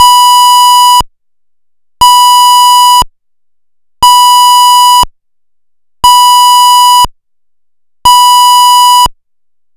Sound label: Interrupted, signaling technology, combined signal; pfannenberg; combined-signaling; PFEER (general alarm)